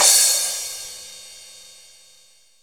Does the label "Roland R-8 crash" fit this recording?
Yes